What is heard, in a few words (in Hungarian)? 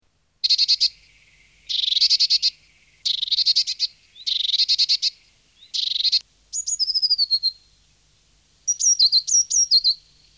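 Kékcinege; Madarak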